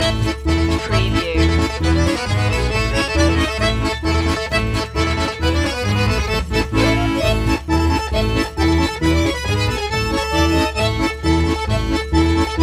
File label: polka loop